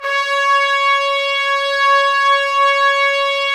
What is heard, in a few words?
BRS TPS, Trumpets; Roland - LA Composer vol. 4